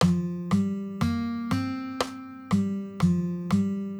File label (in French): liaison mesure